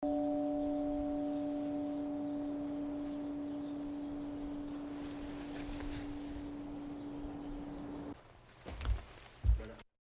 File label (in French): cloche; St Maximin la Ste Baume